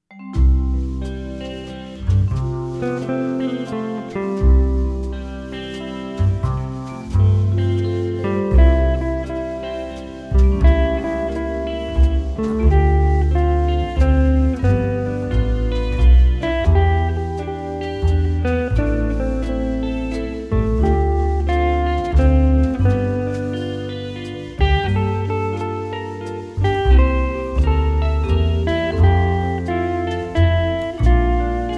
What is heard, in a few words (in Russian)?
Pesnya babushki instrumentalnaya